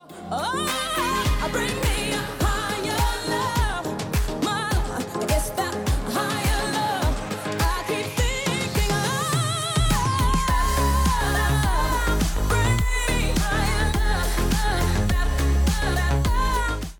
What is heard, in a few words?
BEAT